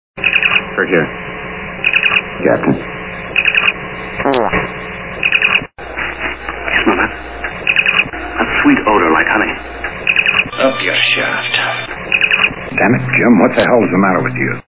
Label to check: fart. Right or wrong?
Right